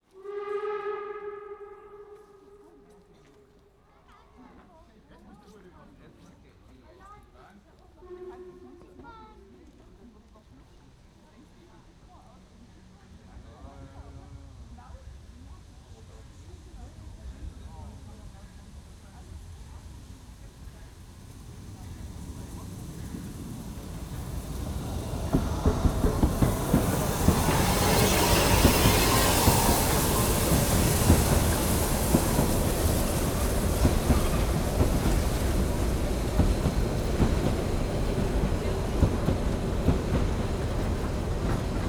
Steam Train Arrival, Whistle, Pass by, Brakes, Squeal, Doors, Some Voices; Tone Glow Libraries; Steam Trains